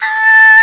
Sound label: ahh; 7invasores